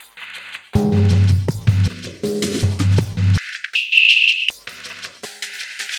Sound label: Loop; Sonic Foundry (Sony Creative Software); Esoterik Beatz; Morph